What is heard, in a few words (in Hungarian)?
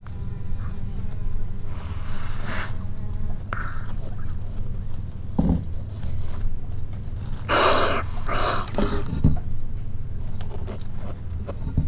rátámad a vizesedényre, sisakos kaméleon; jaszberenyi zoo 2015